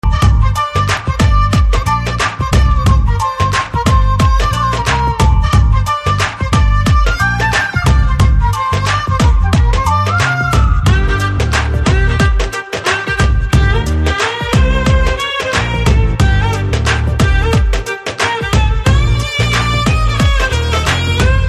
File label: Instrumental Tone